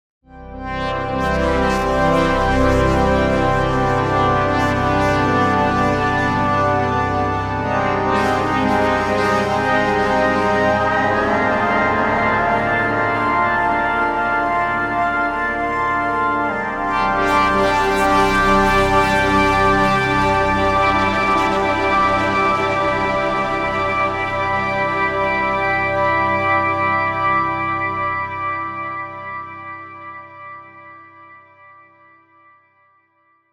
Nonlinear Labs C15 Pad

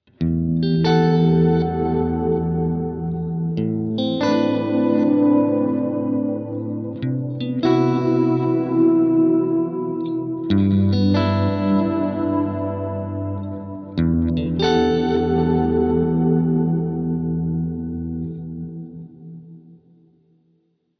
WALRUS LORE Chords

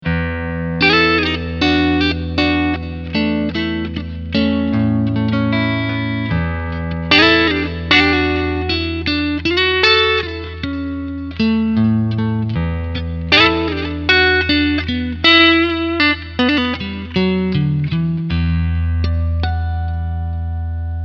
Clean Front SC

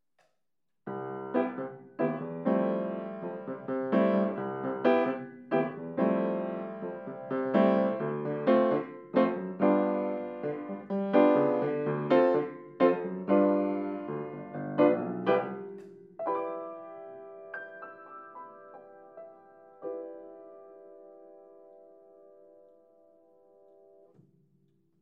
Piano

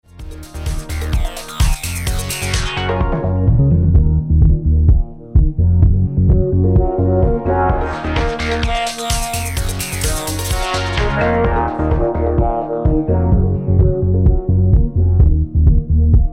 filter

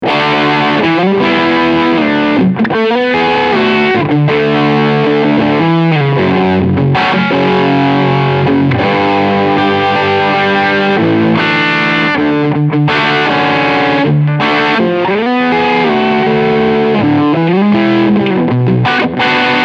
Mid Drive

